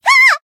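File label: damaged, Japanese; myrus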